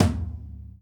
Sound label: tom; foxdot default